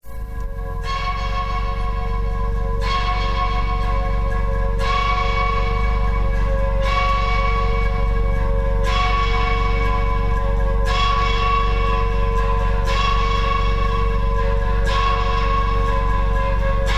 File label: noise